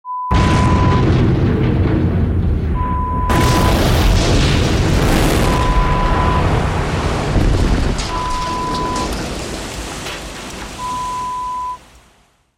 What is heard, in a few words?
Explosion sound